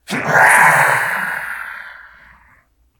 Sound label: pain, voice; garrysmod